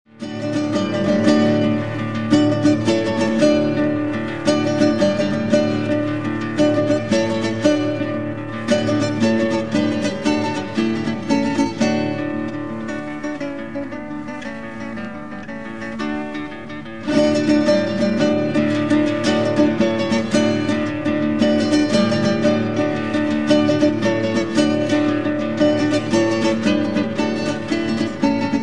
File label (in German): gitarre